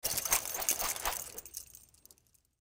Mace Chain Movement Short Fast Spin Swirl Whoosh Around; Warfare; Combat Swing